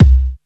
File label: boomy kickdrum sound c key; Mono